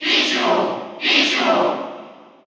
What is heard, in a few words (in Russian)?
Cheer Russian; Pichu; SSBU